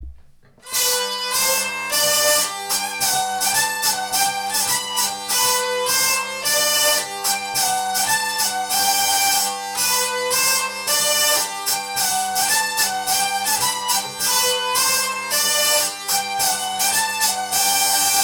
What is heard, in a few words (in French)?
bourree; malochet